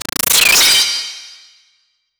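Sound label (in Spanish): efecto escudo mágico rompe, spell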